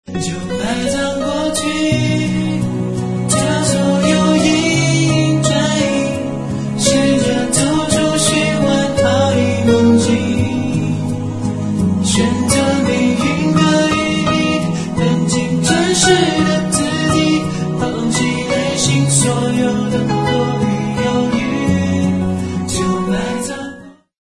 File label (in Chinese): 一首好听的翻唱